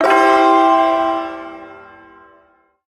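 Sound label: Shawzin Pentatonic Major Chord; Pent Maj Chord F; Warframe; Duviri Ersatz Shawzin; Chords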